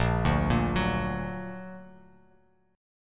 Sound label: A sharp Augmented, Bass Standard; A#+ arpeggiated; JGuitar